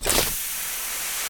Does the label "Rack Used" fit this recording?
Yes